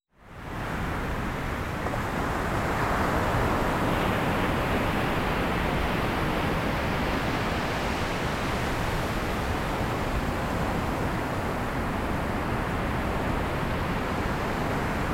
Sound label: waves breaking